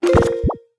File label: Pause Menu Open; Open and close the Pause menu (Recorded)